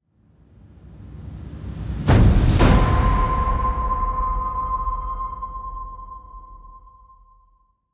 Hit Swo Slide In; Brand X Music; Brand X Music Catalogue - Editor's Essentials

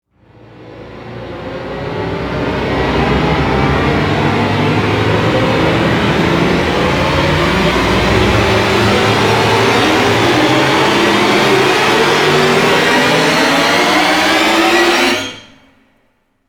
Grating Rise; Ninja Tracks

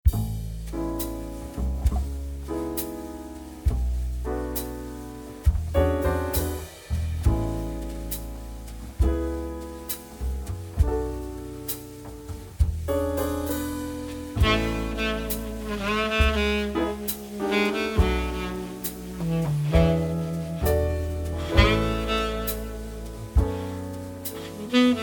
Harmony Jazz Version

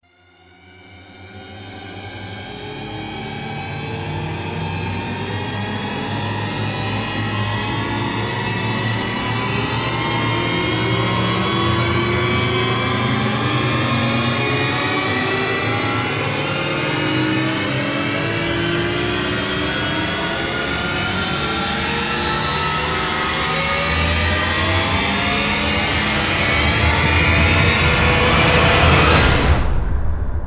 Rise; Ninja Tracks

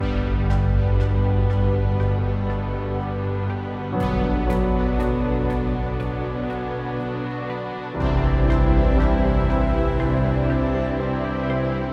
Goodbye Ambient

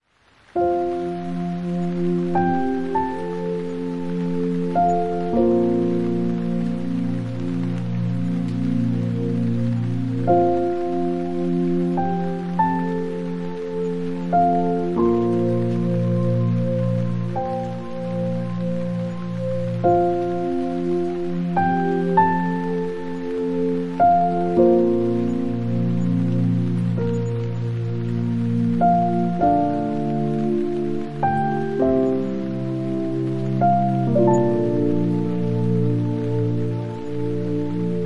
Piano, Music for Dreaming, With Rain

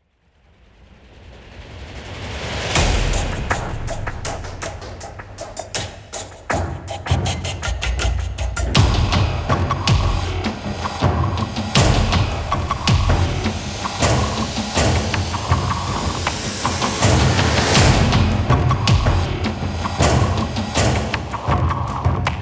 Percussion; Fired Earth Music

